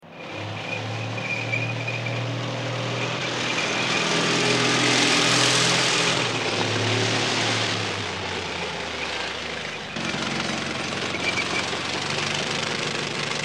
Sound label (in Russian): Танк подъезжает и уезжает, Tank arrives and leaves